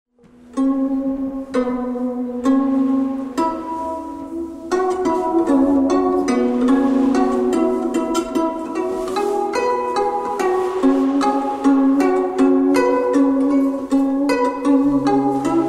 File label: koto